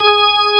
Org G5#; Best Service Gigapack 2

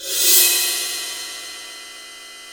crash; East Collexion; Drum 1 Dry

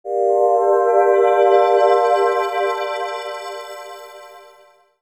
Digi Pads; Jungle Frenzy 1

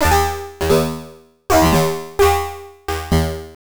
Random Robot Ring 130BPM; sci-fi; Loops

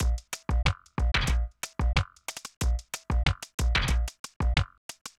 Drum Loops Minimal; Zero-G Smoke

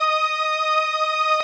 505, String Machines

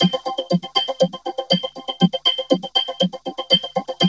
VOC LOOP, VOCODER LOOP; Zero-G Ambient 1

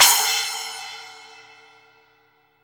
SPLASH, Drum; East Collexion; Drum 1 Dry

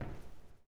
STOMPER; Blues Soul; STOMPS; Spectrasonics Vocal Planet

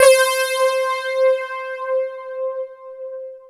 JV polysplit, JV-1080; Syntec; Wall of Sounds

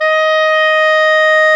Clarinet; Roland LCDP04; 90 s Sample CDs; Clar Cls

